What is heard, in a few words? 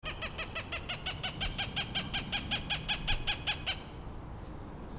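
hawk cry coming from afar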